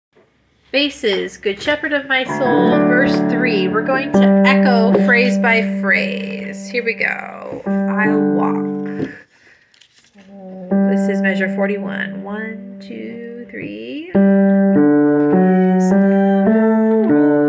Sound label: Bass Shepherd echo